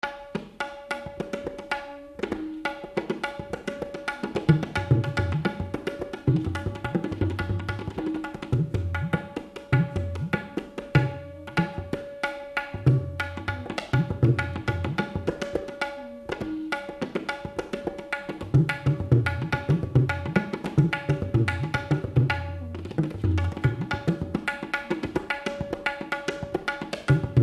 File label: instrument tabla